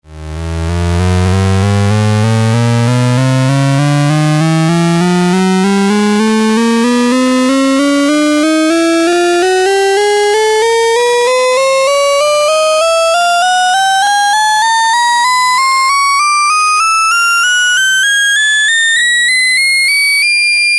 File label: 4024 lfo, modular; fonik